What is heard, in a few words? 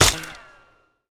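Weapon bb gun fire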